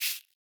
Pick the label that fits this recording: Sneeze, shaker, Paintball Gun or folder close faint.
shaker